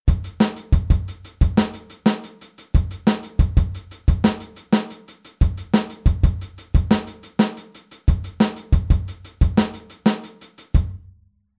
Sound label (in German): Groove 16tel; Aufteilung linke und rechte Hand auf HiHat und Snare; Standardnotierung